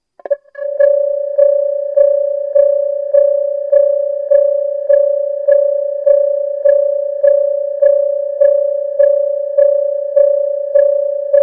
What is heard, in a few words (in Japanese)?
Susono Fumikiri